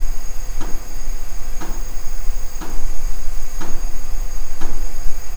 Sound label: stepped coll move; lhc collimation